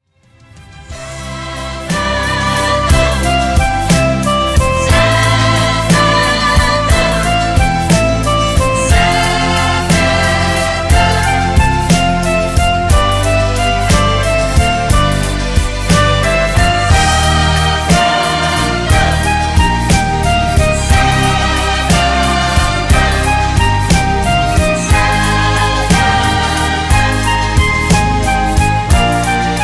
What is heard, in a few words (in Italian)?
Terzinato Canto